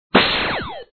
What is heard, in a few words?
shot